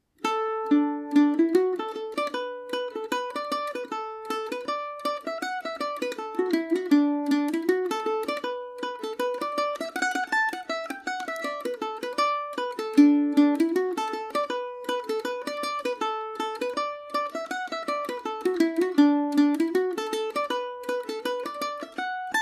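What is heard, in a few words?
barrowburn reel D major, irish mandolin; Paris Swing Macaferri mandolin; Listen to me playing The Barrowburn on my Paris Swing Macaferri mandolin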